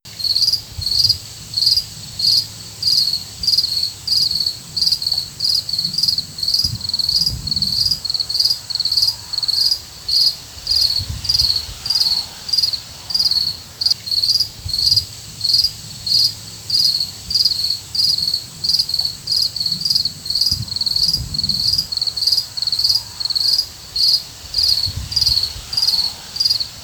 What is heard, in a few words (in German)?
Grillen